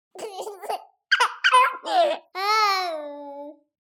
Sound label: BABIES LAUGH Girl 24 months High Reverberant; Baby Boom